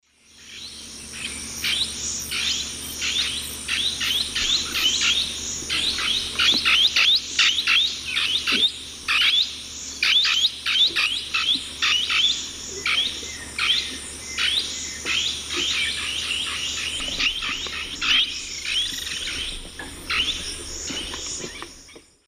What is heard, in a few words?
Pionus menstruus, flight calls; S. America; Eastern Lowlands